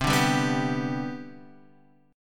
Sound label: C Major 7th Suspended 2nd C down Guitar Drop D